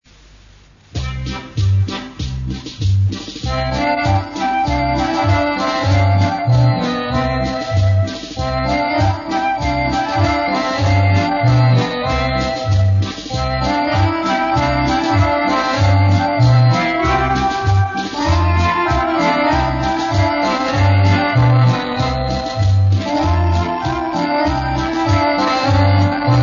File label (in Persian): آهنگ